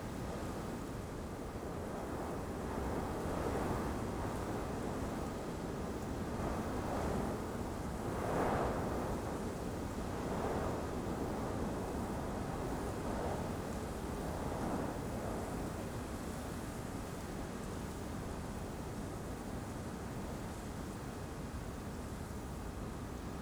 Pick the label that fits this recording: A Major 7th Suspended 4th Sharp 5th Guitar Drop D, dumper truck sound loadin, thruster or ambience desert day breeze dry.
ambience desert day breeze dry